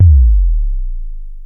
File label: Roland SH-09 Bassdrum